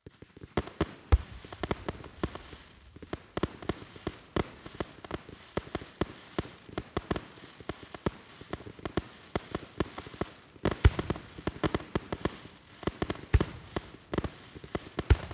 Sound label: fireworks multiple shots and crackling